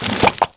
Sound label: meat head shoot